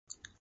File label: Suck; Lewd SFX